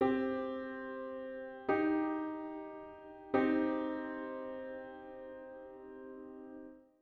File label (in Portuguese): Elementos de Dó Maior e de Mi bemol maior dá Dó maior